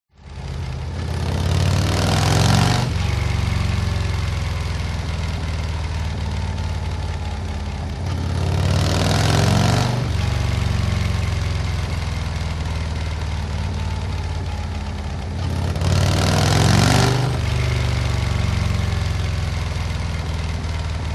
MOTORCYCLE Harley Accelerate Triple Hard; TRANSPORTATION

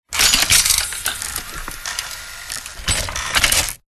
Sci-Fi Mechanical; Ryan Van Eerde

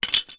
industrial monkey wrench down on ground

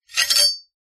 warfare knife blade draw

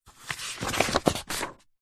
household book artists sketch pad page turn; zapsplat

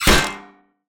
fantasy Trap; little robot sound factory

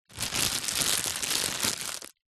foley plastic pouch small handling; zapsplat

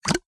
cartoon suck tongue mouth designed; zapsplat

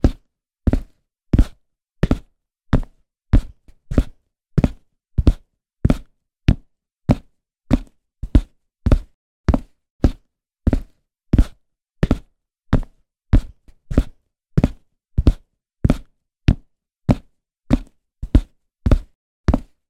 foley footsteps work boots hard ground; zapsplat

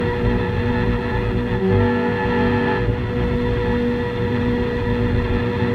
Ambience Sinister Electric Cello Loop; little robot sound factory

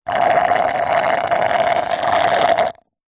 food drink milkshake thick suck dregs straw slurp

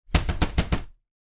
household person tap knock on plastic vent cover

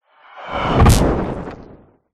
sound design whoosh airy into impact electricity; zapsplat

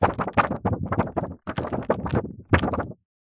paper laminated sheet shake short fast; foley

